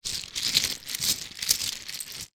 game plastic houses and hotels in cloth pouch movement; monopoly